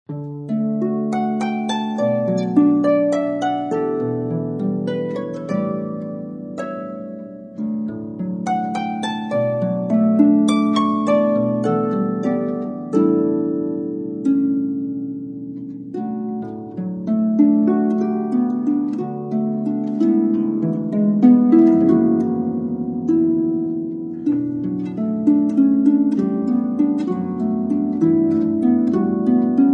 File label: Harp Hymns